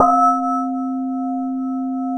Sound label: WS HI BELL; CMP - Analogon; RESO